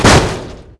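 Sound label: impact vehicle hard; Cars; XBOX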